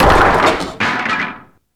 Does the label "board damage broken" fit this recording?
Yes